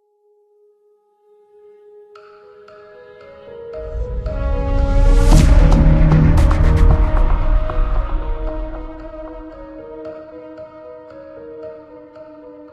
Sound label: Menu Music Ambient; Pixel Gun 3D